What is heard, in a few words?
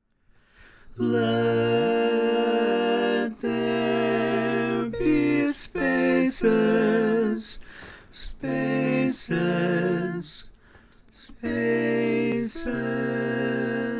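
Baritone, barbershop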